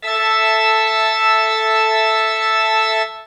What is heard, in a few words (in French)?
quinte juste